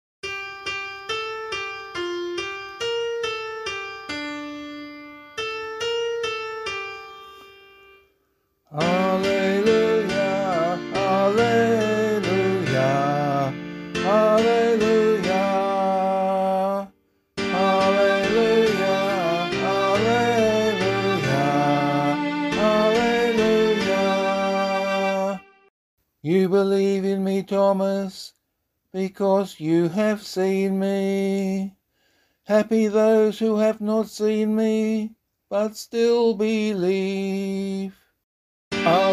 vocal, Gospel Acclamation for Australian Catholic liturgy